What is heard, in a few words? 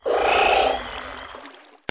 jungle; Professional Soft Collection 1.02; win95